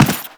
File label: run default, footsteps; Warframe; Teshin